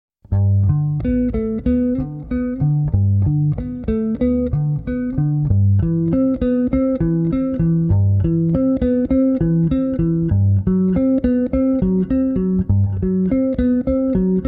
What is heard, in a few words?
Prelude Bass Solo